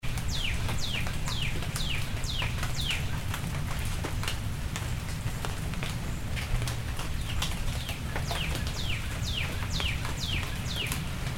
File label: Slight Drizzle with Birds